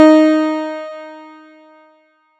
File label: Piano, Harmonic